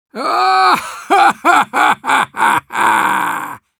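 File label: laugh long, Russian; Demoman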